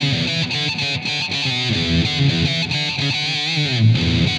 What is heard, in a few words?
Distorted Guitar